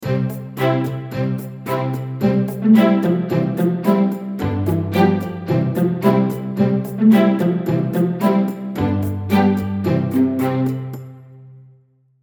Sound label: London Bridge; ukulele; Rainbow Ukulele Play-Along